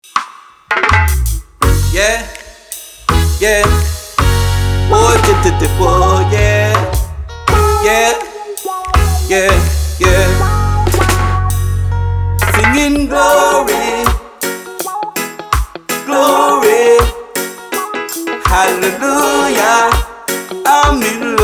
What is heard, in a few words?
SINGING GLORY, reggae